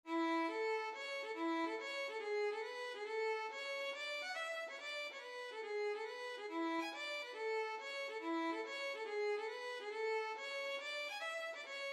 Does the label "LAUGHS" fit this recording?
No